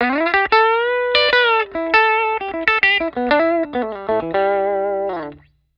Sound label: LP BRIDGE LICK, humbucker pickup; patent denied humbucker pickup